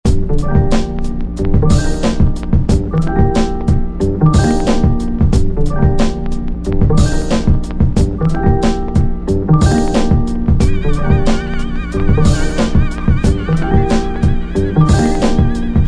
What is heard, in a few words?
laptop beat